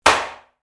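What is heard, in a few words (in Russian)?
удар папкой с документами об стол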